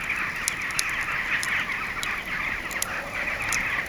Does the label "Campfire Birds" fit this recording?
Yes